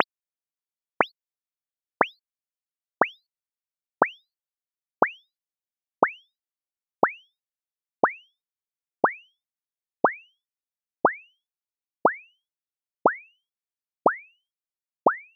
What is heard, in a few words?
chirp